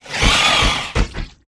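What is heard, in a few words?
angry buff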